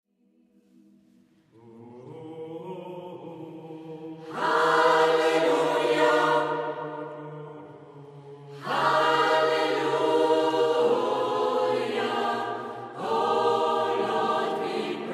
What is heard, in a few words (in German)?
Halleluja